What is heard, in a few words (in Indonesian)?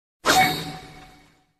Ringtone Notifikasi Jutsu; Naruto